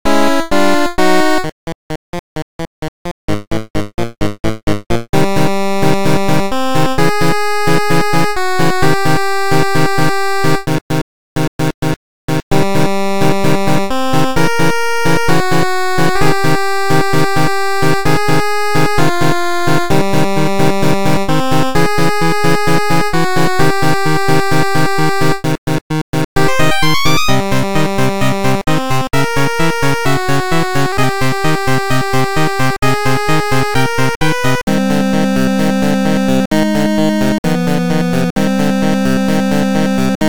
8bit loop; sentou two

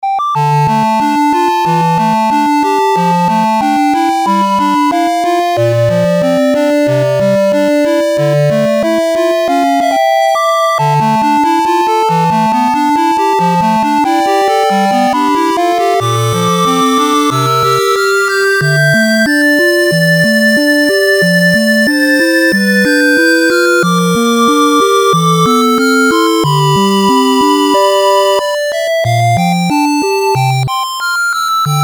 8bit yuukyuu sora

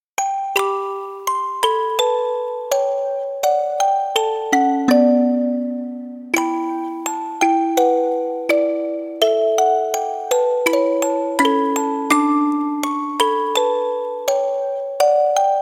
musicbox kizoku shiro

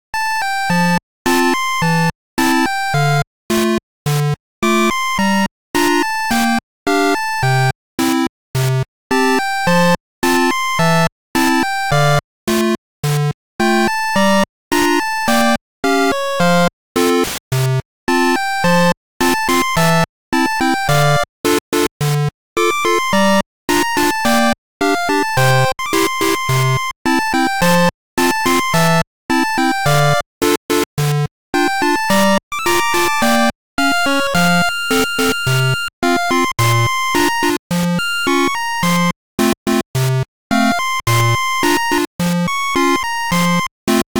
8bit Loop; tanoshikatta onsenyado

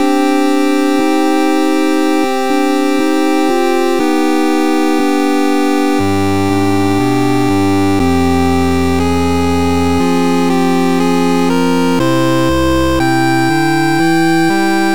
8bit requiem Loop

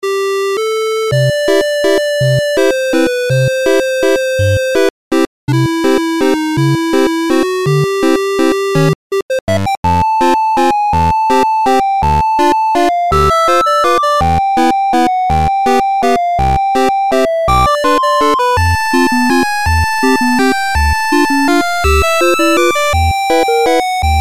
8bit; yuuga umi

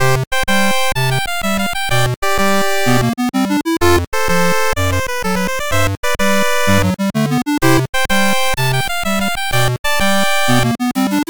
8bit march Loop

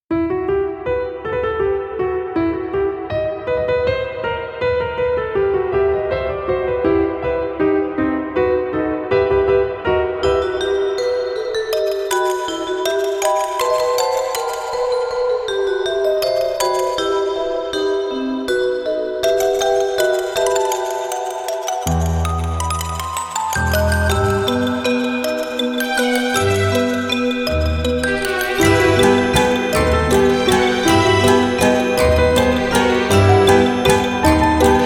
waltz

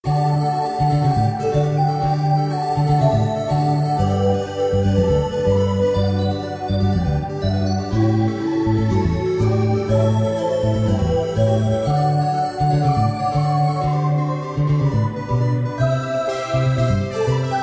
pop action Loop

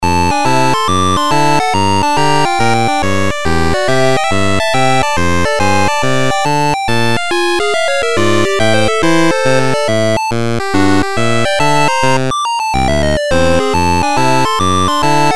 8bit casino

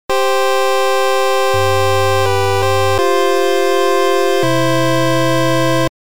8bit

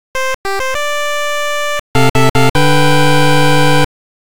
8bit fanfare

